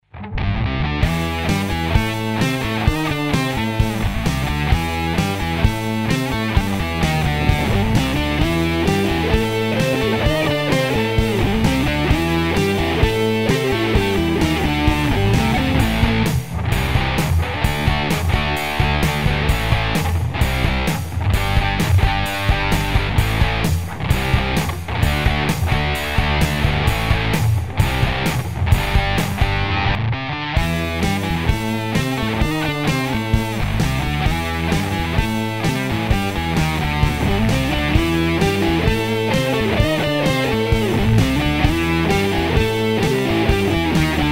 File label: FZ Fuzz star; Biyang